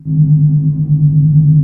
WARP ENGINE, SF EFFECTS; Q Up Arts; Dream Experience

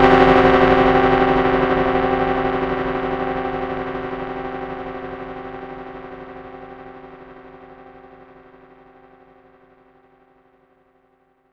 FX REVEAL; ModelCycles

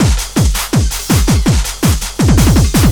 LOOP, Eurotech; Sounds Good

